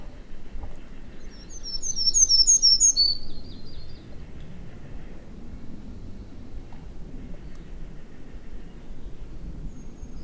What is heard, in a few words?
Hermit Warbler Setophaga occidentalis